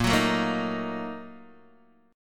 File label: Bb Major 7th Suspended 4th Sharp 5th, Guitar, DADGAD; x,1,4,2,1,1